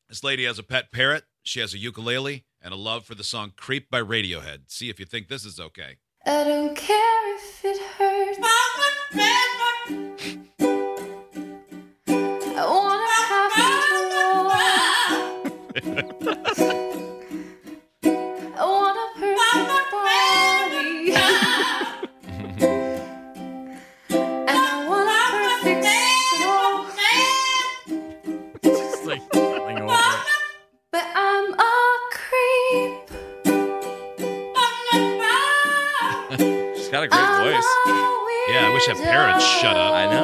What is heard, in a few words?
a girl her parrot singing